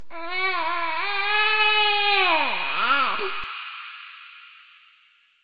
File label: baby cry